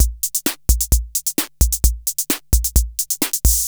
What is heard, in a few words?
KR33 POPS; Crypton - DrumBase5000